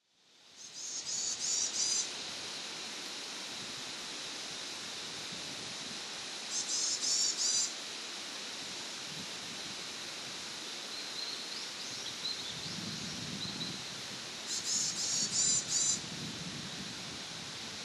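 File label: varied tit call, tanzawa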